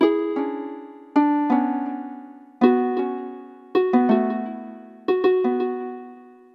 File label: guitar